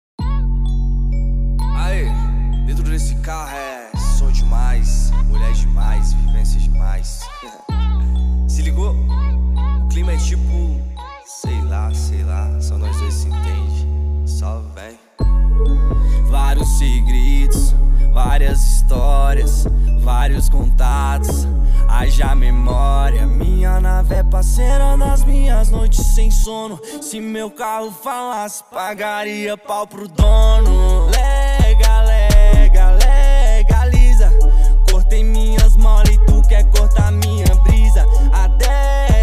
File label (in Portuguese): graves bass